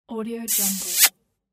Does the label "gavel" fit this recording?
No